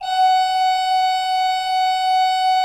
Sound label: F4, Cathedral Organ; ILIO; Propeller Islands